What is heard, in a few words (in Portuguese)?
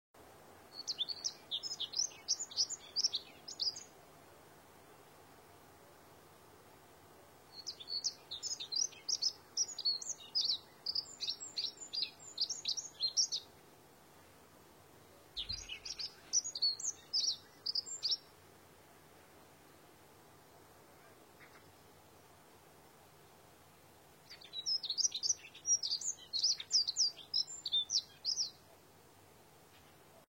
canto do rouxinol de garganta rubi